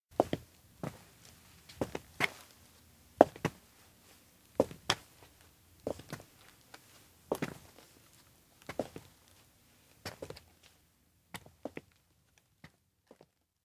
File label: steps outdoor road walk; awayuki; anemoi